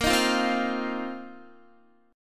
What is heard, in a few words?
Bb Suspended 2nd Suspended 4th Bb down Guitar; Bbsus2sus4 strummed